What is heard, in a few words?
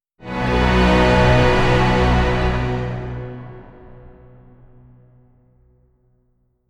scare sting